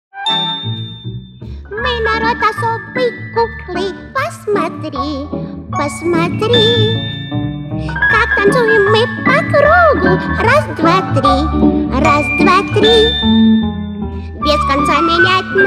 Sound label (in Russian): Песенка куклы